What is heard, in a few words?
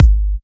Bassdrum